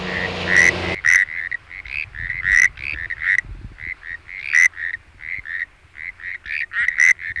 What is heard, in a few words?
Dendropsophus luddeckei